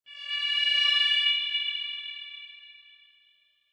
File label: Whoosh; Sound Design; Creepy high frequency whoosh, Manacing and piercing; Michael Huang